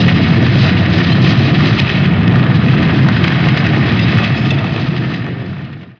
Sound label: stampede movement; weapons; dota; centaur